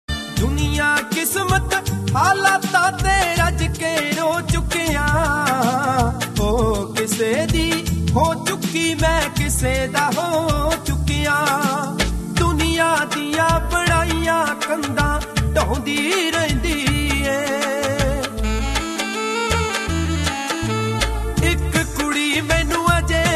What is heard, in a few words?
Mobile tone